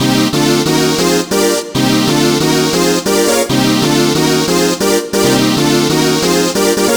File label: Stereo Stab; Rave Generator 3; 90’s Rave & Techno VST/AU/AAX/CLAP Plugin